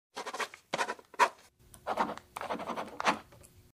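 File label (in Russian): подпись ручкой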